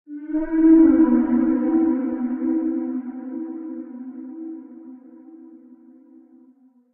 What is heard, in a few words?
whale idle; Barotraumatic; Shadowwhale